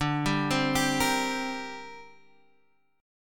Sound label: D 7th Suspended 4th Sharp 5th, down, Guitar, Half Step Down; D7sus4#5